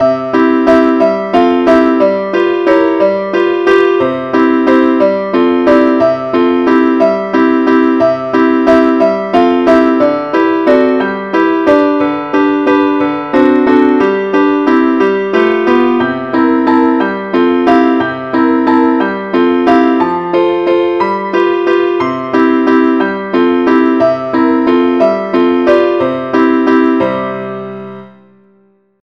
advnceacc key c; angelvoices

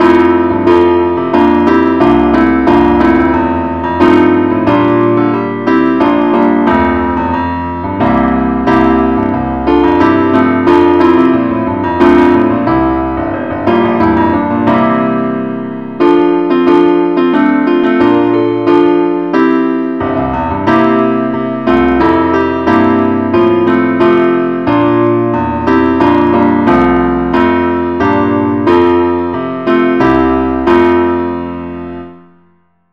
keyboard only, key f

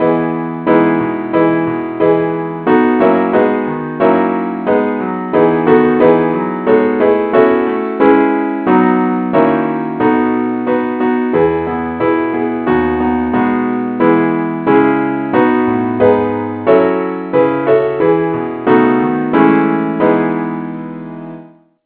keyboard key E; saintthomas

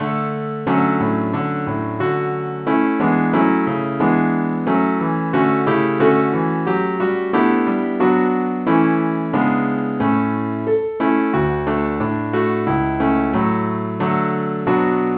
keyboard only key d; SAINTTHOMAS

